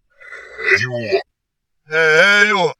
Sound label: Male Vocal Reversed